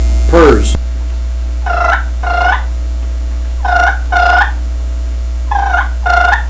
blackbeard purrs, turkey; Southland game calls

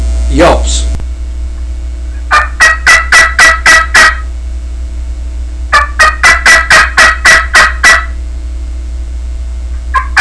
cuttaway yelps, turkey; Southland Sounds

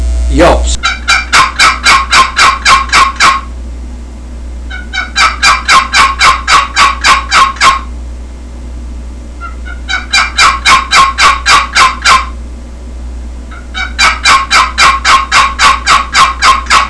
yelps, friction box; ww box; blk myst

